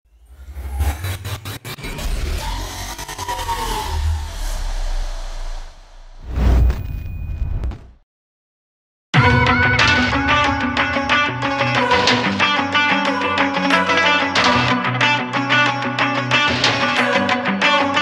hard uplifting rap beat motivational choir; prod jordan b

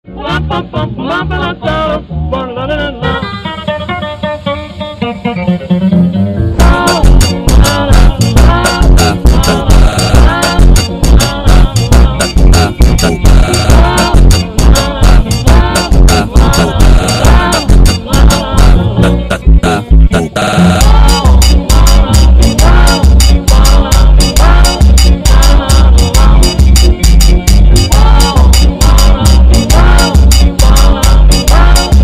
funk normal slowed super slowed ultra slowed

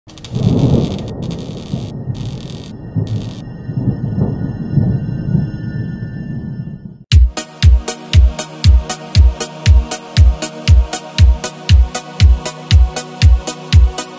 euro dance euro disco; best disco songs

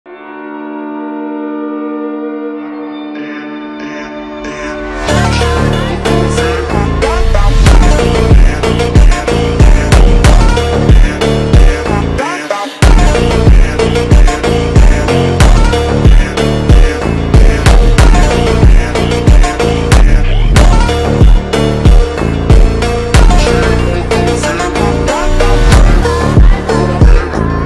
slowed phonk

